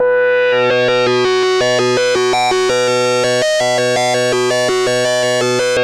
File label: JUP 8 B3, JUPITER 8, Rare Synthesizer; Hollywood Edge - Giorgio Moroder Rare Synthesizer Collection